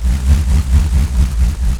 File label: BULLROARER, Primitive Instruments